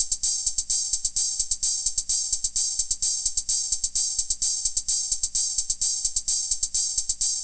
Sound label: Fill, Drums, Eclectic Electronica